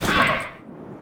PEACE DAMAGE

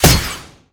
Sword Metal, Damage

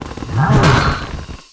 STONE BLOCK WAR ATTACK

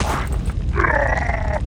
PEACE DAMAGE

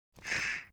ratmoth; Mob

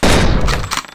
gun, Weapon

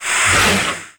CROOK WAR ATTACK

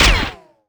Gun Metal, Damage

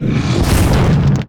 Rust Attack

